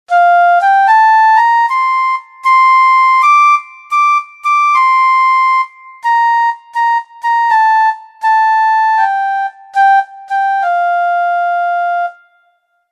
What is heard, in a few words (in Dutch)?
Fanfare Piccolo; Toontje Hoger